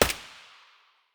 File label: sig550 silencer distant; S.T.A.L.K.E.R.; IWP